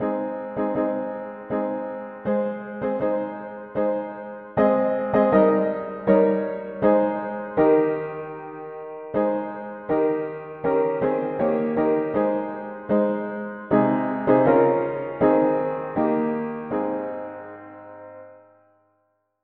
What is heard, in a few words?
mono piano, hymns; Viens a Jesus qui t appelle